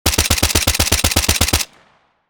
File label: MK2 assault rifle short burst; war; gfxsounds